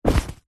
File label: luggage bag rucksack set down carpet; zapsplat